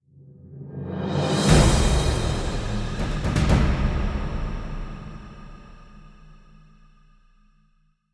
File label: Attack Stinger; Mass Effect 2